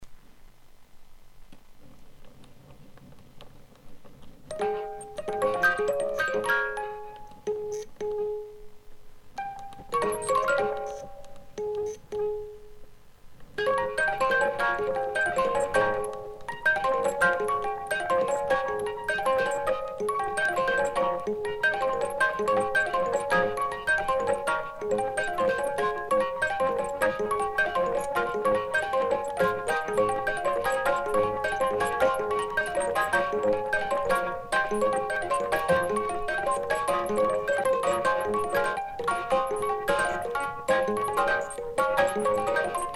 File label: music box study